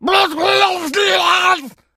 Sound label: shout, infected; become alert